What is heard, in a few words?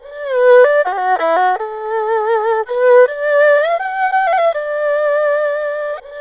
ERHU, 80; INIS - Opium